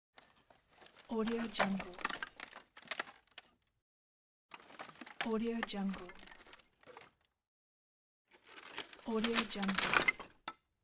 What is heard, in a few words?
wooden debris rustle movement fall